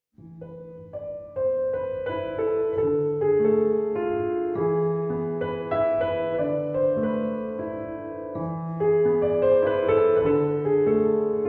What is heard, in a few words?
Piano; Piano on Player